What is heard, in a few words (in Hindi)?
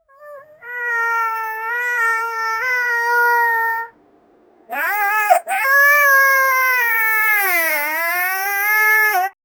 bandar ka rone ka awaaz; monkey